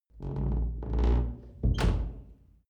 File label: old door close soft